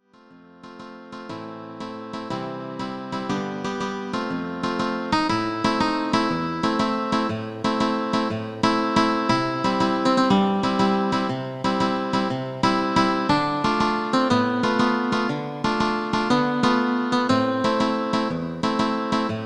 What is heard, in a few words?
synth